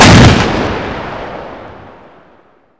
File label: TANK CANNON